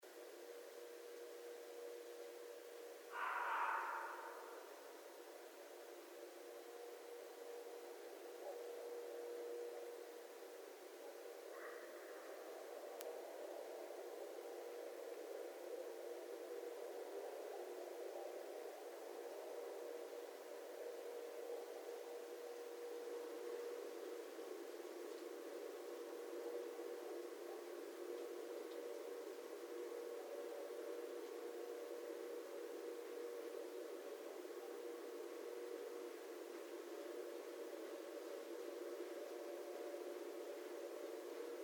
Red Fox

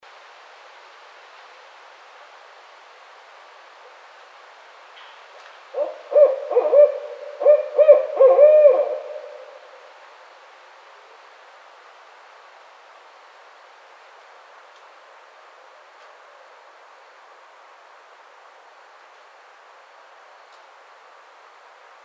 Barred Owl

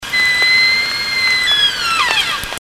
Elk

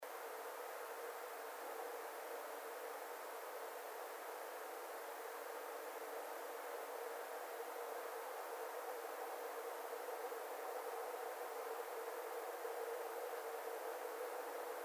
howl, Coyote; Coyotes (Canis latrans)

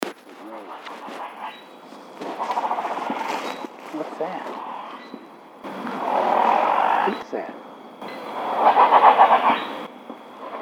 Weed-eater; bigfoot

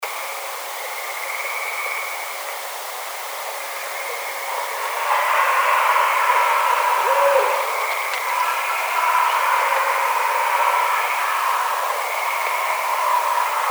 Hissing, bigfoot

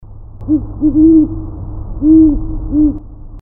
Great Horned Owl

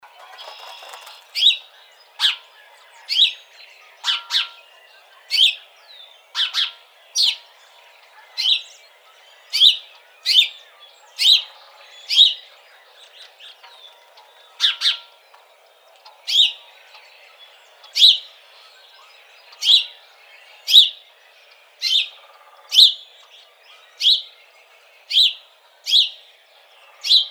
House Sparrow

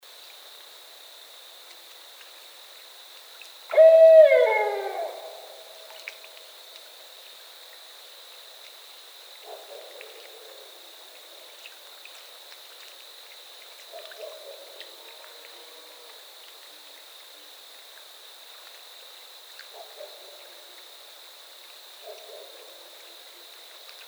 Barred Owl

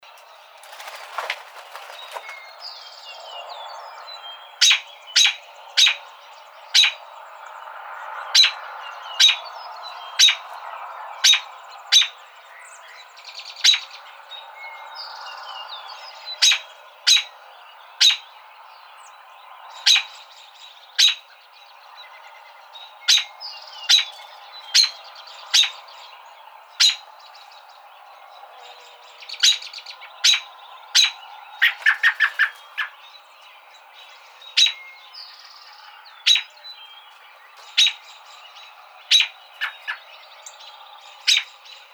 American Robin

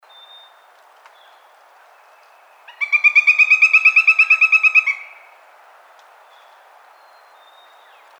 Pileated Woodpecker

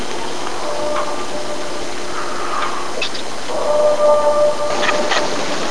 Oconee County Howl

